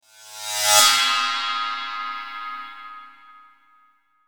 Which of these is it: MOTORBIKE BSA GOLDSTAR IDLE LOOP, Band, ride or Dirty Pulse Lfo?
ride